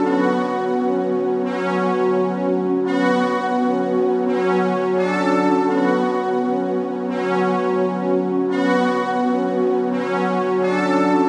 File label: Synth Stringpia; Sonic Foundry (Sony Creative Software); Knutrix Drum & Bass